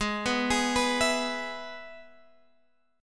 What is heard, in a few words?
Ab Minor Sharp 5th, Guitar, Open G